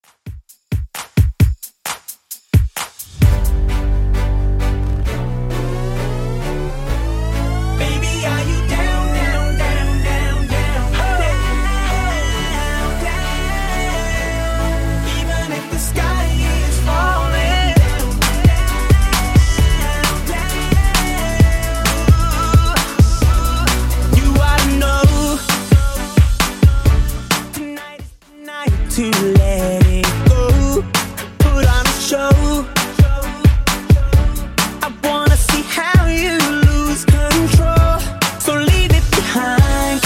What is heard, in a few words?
132bpm